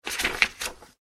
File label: household book page turn; Offices; Books; McKinney Sound